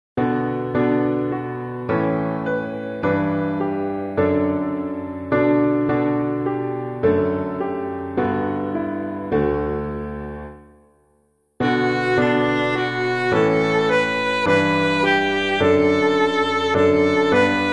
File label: piano